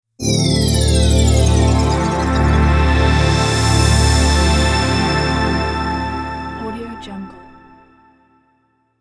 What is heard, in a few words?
swelling synthesizer shimmer logo; electronplanet